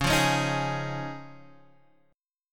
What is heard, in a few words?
F Minor 7th Sharp 5th, C sharp